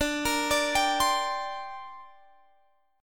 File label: D 7th Suspended 4th Sharp 5th, down, Guitar, Open G